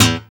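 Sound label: GUI PULL, acoustic guitar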